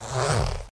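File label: zipper down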